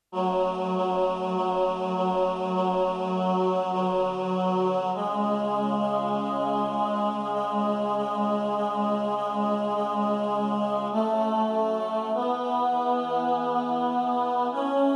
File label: tenor; Adoramus te Christe